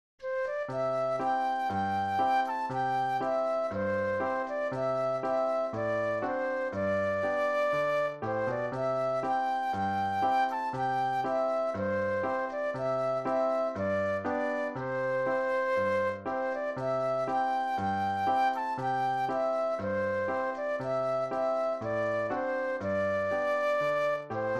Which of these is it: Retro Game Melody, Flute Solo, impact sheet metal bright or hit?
Flute Solo